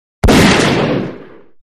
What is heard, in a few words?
Rifle Shot 4 Boomy